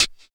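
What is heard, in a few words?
HAT, left; East West - Phat & Phunky CD2; 90's Sample CDs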